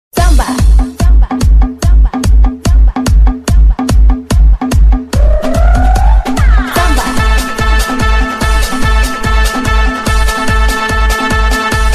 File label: Samba Mix